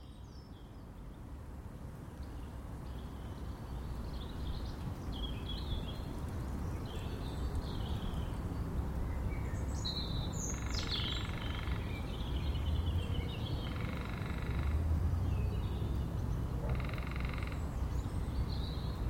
soundscape cemetery woodpecker

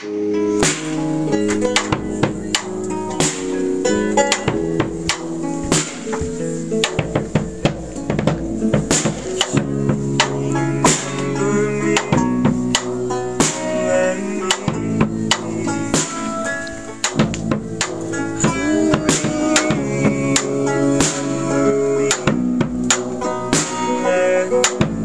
soundcheck

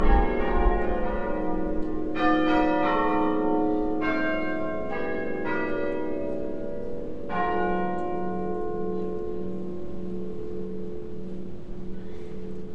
very short burst of bellringing

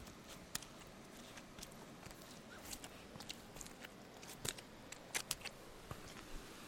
walking on wet boggy grass

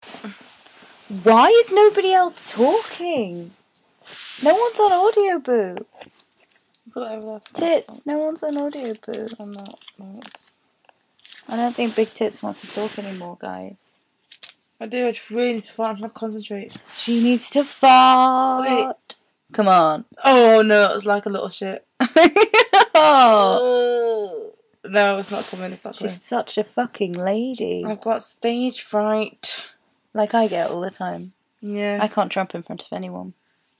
farts

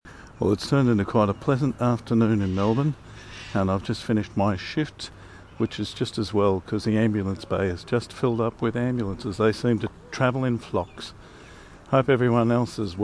ambulance

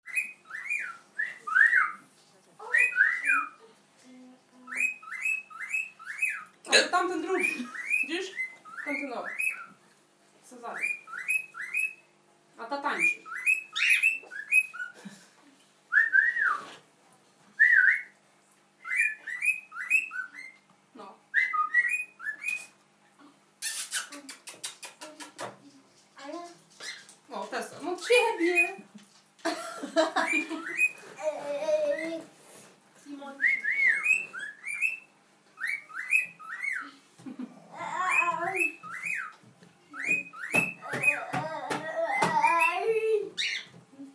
parrot singing; my aunts parrot

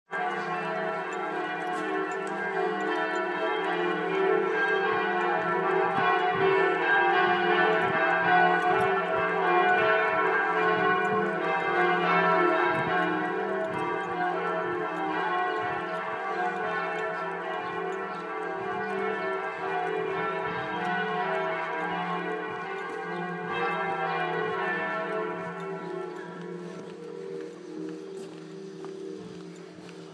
bells

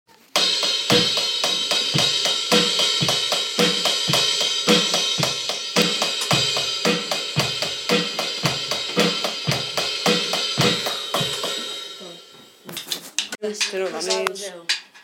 live drum recording

